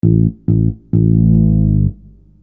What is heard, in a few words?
Fretless Bass; Construction Kit; HIP-HOP; Sonic Foundry (Sony Creative Software)